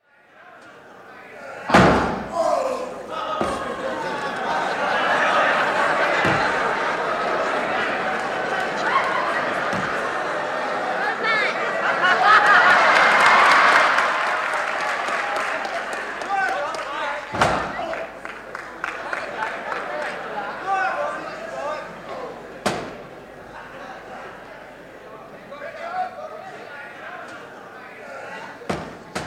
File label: Laughter & applause after throw; Laughter Sound Effects; Voice; BBC